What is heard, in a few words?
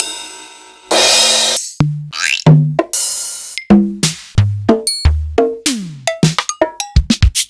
Elka Drumstar 80, Samples Sliced